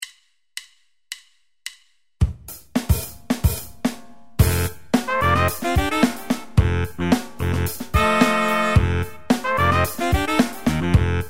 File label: funk